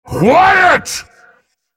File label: spell cast effort, en US; dandychogath; Character Voices - English